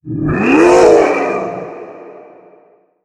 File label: monster; MonsterVoiceお手軽パック